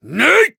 Voice attack

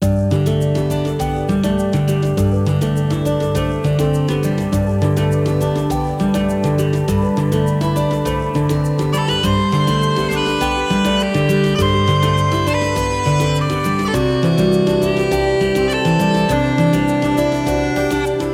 bgm field modern

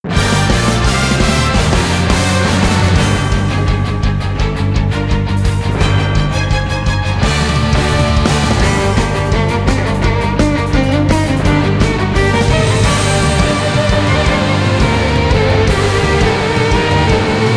Bgm battle

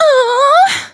damaged; lele

